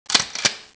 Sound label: stakeout pump